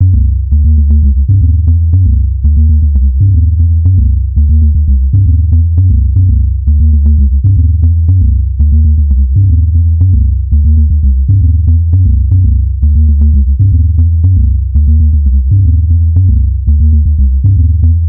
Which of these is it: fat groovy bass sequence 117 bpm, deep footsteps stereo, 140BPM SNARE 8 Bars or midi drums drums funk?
fat groovy bass sequence 117 bpm